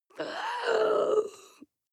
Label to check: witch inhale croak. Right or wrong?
Right